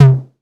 808 TOMS; Wizoo - Platinum 24 Electronic

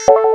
ALARM FX; Big Beat Samples & More; AKAI; ALARM KIT

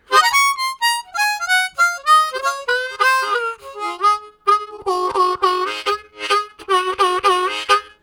Gathering Down, Harmonica Riffs; Zero G

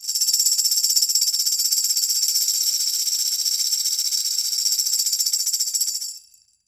TAMB TREM, TAMBOURINES; EdgeSounds; Drum Mashines VOL-1

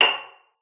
PIZZ, VIOLIN; Xsample VOL-5; 90 s Sample CDs; VIOLIN FING

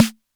SNARE, drum kit; Polyend; Stazma & Concrete Collage

